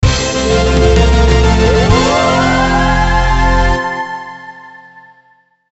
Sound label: Bonus Stage Clear